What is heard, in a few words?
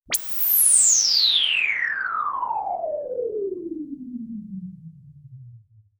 sweep, SCIFI; Techno Trance Essentials